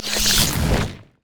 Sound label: thorn lasher death; Zyra; LoL